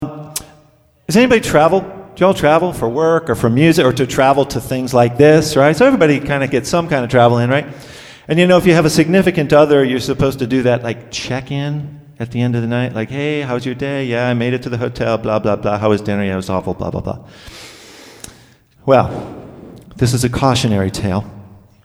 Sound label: Texting Song